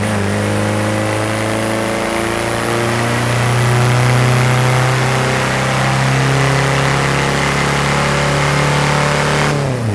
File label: third, vehicles; beetle